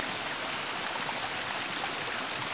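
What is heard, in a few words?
waterfall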